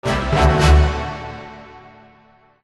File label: Jingle, Player Lv; Puzzle & Dragons Battle Tournament